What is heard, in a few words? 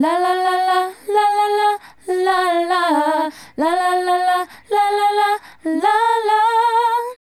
Female Group, Hip-Hop and RnB Vocals